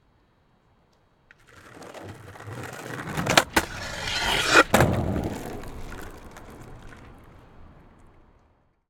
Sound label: Skateboard, Jump, Grind, Deck, Banquet; Rzpost; Skate on Board